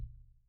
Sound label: Kick In